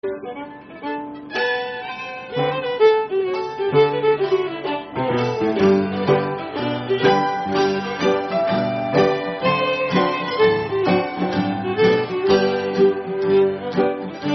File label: Schottische; Rochester Schottische